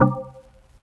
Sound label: DX200 Bell Lo Vinyl Synths Cmin9 C2; vinyl chords